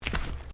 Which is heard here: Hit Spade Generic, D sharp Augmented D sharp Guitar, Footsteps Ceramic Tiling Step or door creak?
Footsteps Ceramic Tiling Step